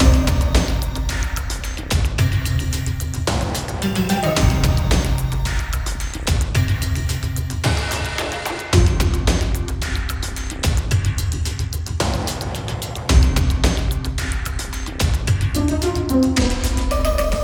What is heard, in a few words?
Dungeon World Main LOOP